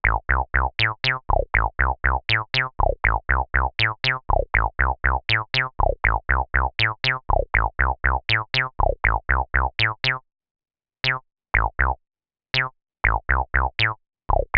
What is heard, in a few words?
Res Saw